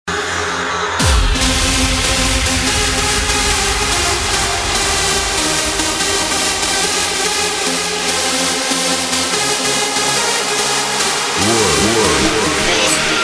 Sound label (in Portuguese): Hard Pancadão Automotivo